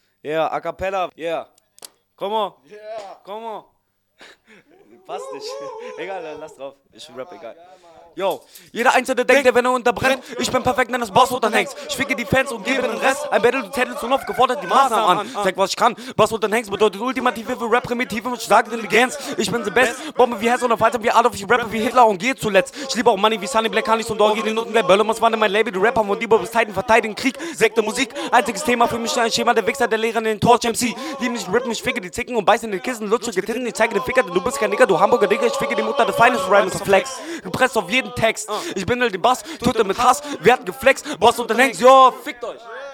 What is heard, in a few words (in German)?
Outro (Acapella)